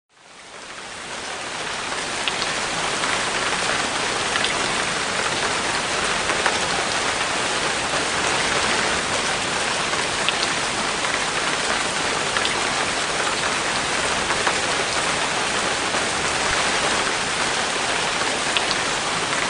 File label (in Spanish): Lluvia Efecto de sonido